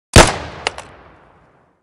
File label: M1911 gunshot; 3D FPS